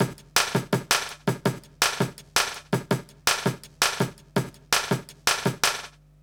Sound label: 165 break, Explosive Jungle; USB Soundscan vol.05; AKAI; 90s sample CD